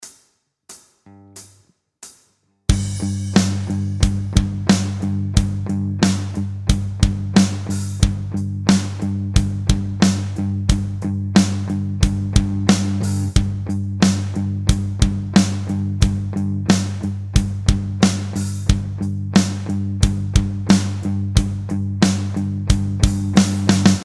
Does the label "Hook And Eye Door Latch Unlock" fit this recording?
No